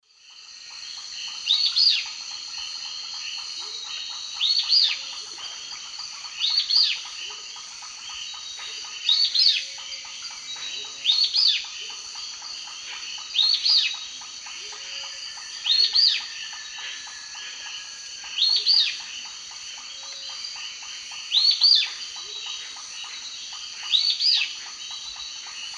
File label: Hylophylax punctulatus, song; S. America; Eastern Lowlands